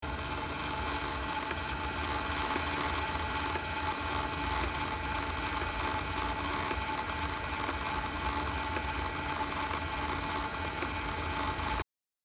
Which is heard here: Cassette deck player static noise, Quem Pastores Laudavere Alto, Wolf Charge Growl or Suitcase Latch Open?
Cassette deck player static noise